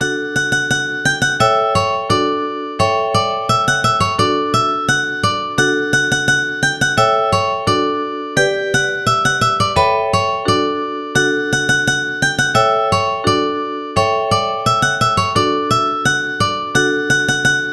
La Bastringue UKE

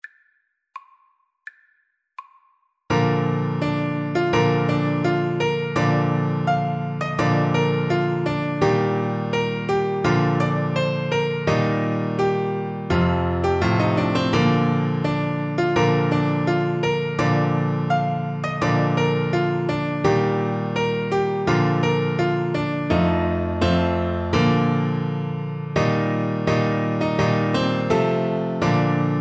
scotland the brave, Bassoon

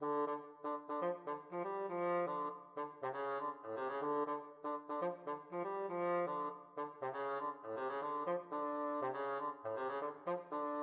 Funk Study, bassoon

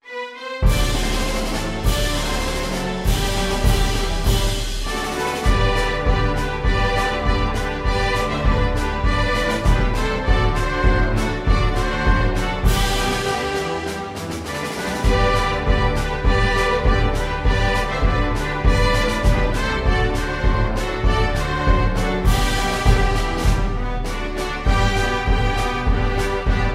flexible mixed ensemble, 4 players

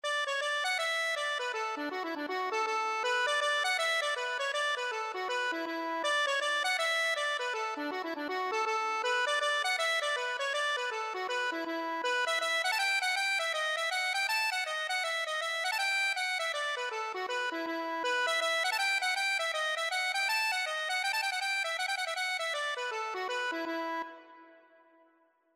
accordion

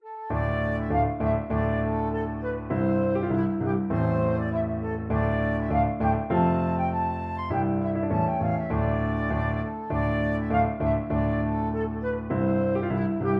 the witches hill, flute

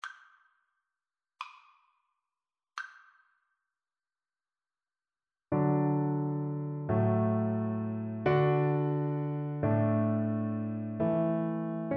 verdi nabucco viola

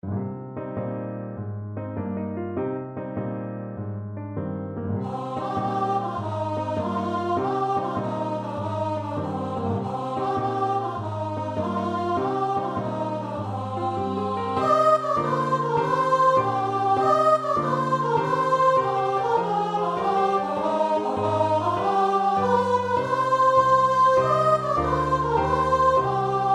masters in hall VOICE, unison choir

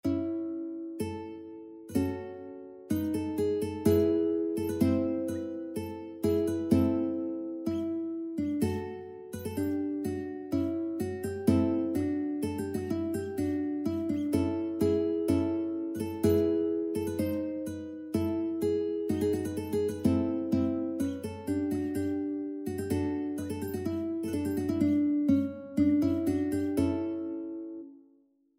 mayr havado, ukulele

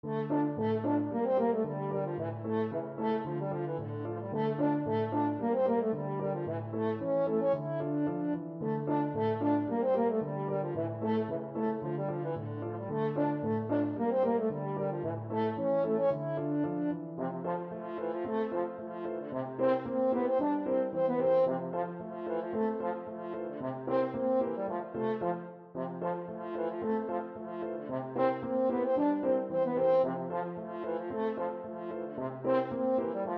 Knapsauspolkka, french horn

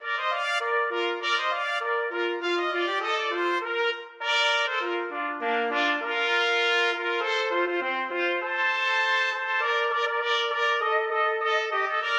grand old duke trumpet duet

